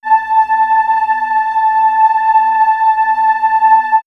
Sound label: Swirls Ambient Drone, pads; X-Static Goldmine